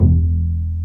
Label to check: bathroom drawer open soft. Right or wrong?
Wrong